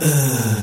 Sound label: ugh; tao